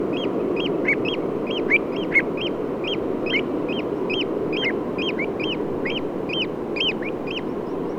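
pied avocet, flight call, eurasian oystercatcher, flight call; titchwell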